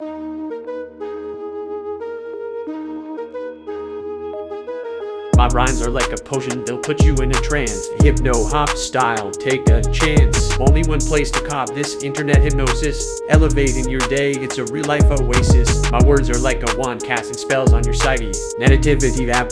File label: Hipno Hop Rap sample, subliminal affirmations